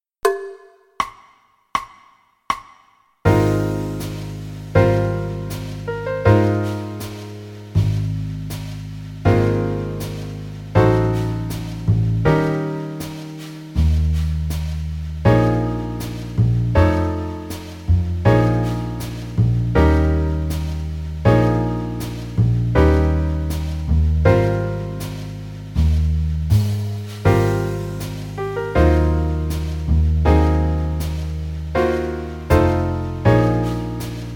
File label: Play-along in Ab, qn=80; 2 choruses; Jazz Piano; iReal Pro